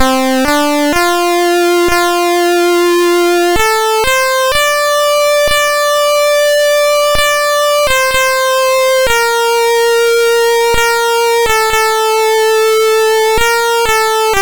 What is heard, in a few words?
Music, MOS 6581